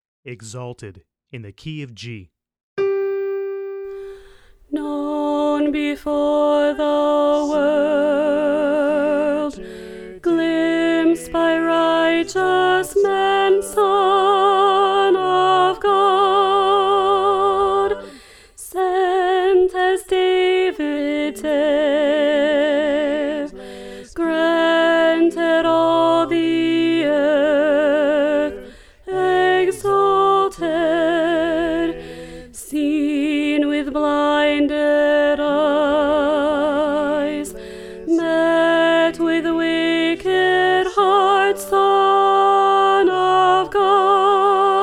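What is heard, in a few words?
Alto, choir part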